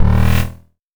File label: Powerup